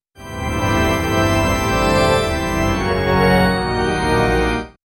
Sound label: distant cathedral organ m